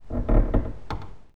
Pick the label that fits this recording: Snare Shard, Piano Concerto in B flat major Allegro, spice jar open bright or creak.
creak